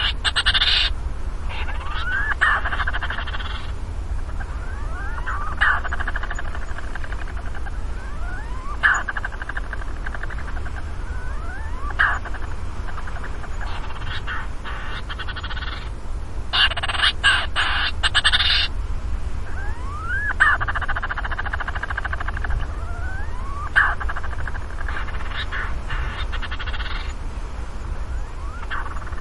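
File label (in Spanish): Paiño de Swinhoe Oceanodroma monorhis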